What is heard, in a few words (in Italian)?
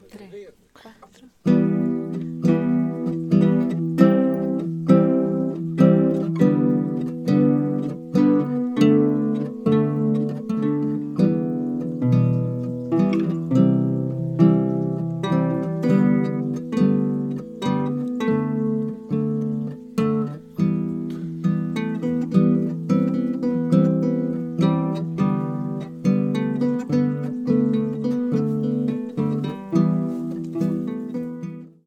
Blue Yeti chitarra live